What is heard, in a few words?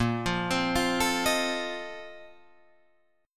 A sharp Major 7th Suspended 2nd Suspended 4th A sharp Guitar Drop D